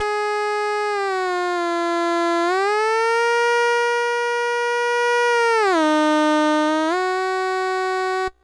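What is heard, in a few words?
kid keys overdrive distortion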